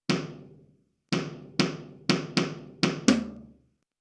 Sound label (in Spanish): Ritmo